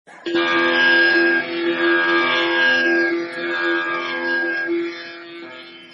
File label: Veena